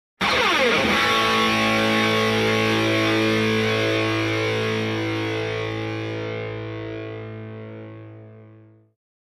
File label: Distorted rock guitar chord strum